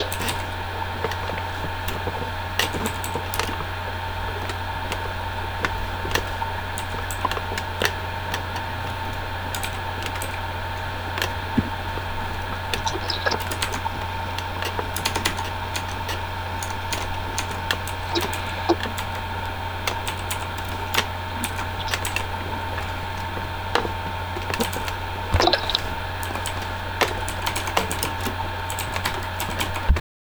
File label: cyber chair